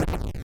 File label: hammer hit; LD55